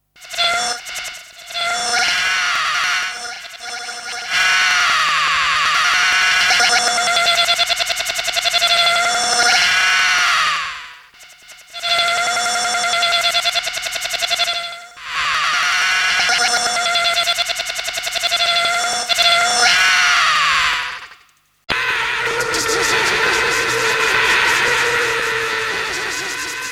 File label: Loop stutters